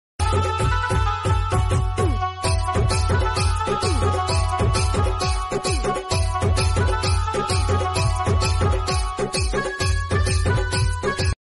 benjo instrumental, nagpuri

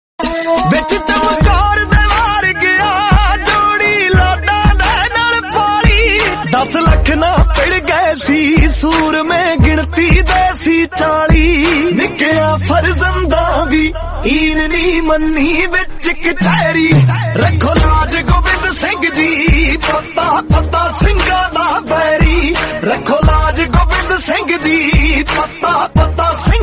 punjabi; chaar sahibzaade movie; chaar sahibzade chote sahibzade

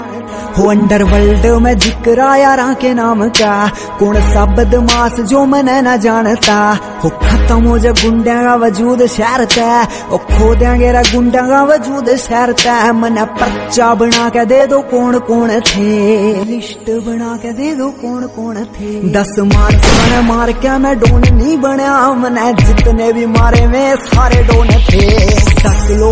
remix, hindi song

